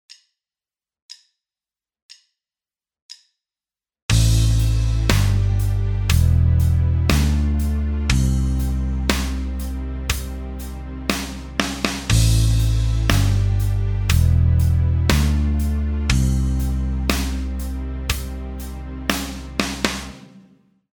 In The Woodshed backing, Guitar Techniques; Guitar Techniques 2017 Issue 275 November

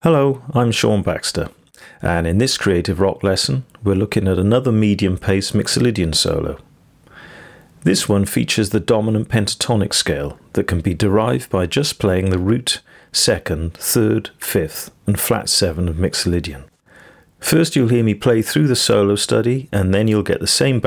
Creative Rock intro, Guitar; Guitar Techniques 2019 Issue 297 July

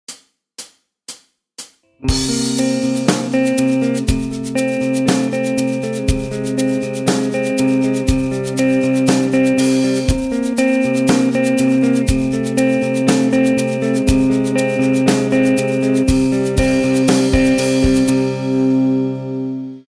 Slide backing; Guitar Techniques 2018 Issue 288 November

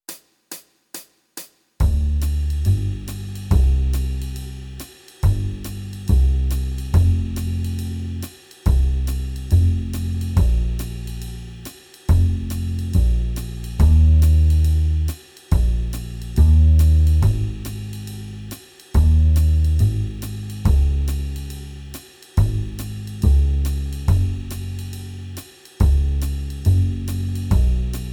Jazz backing; Guitar Techniques 2019 Issue 294 Spring

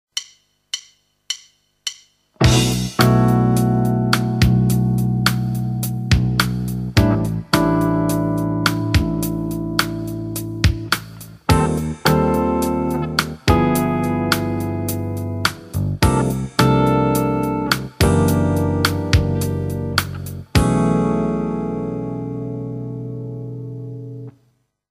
Chord Camp backing, Guitar; Guitar Techniques 2017 Issue 266 March

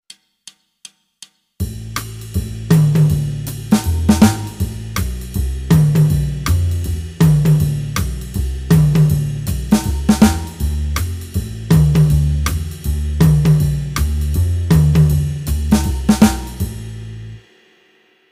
Jazz backing, Guitar; Guitar Techniques 2018 Issue 278 February

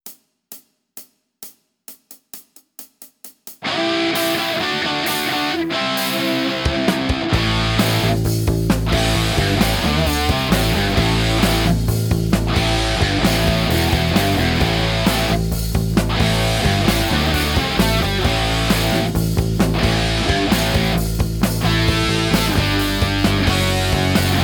Rock, guitar; Guitar Techniques 2018 Issue 289 December

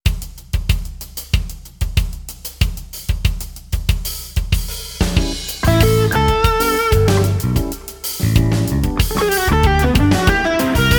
Creative Rock, Guitar; Guitar Techniques 2019 Issue 296 June

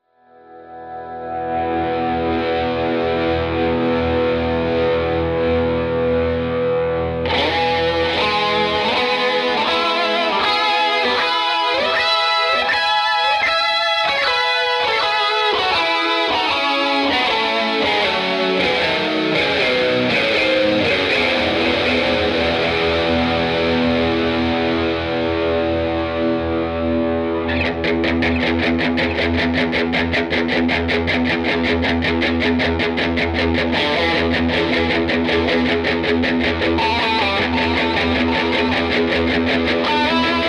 delay; Guitar Techniques 2019 Issue 290 January

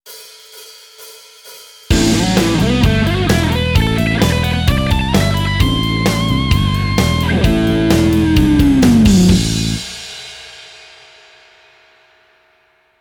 One Minute Lick fast; Guitar Techniques 2019 Issue 296 June

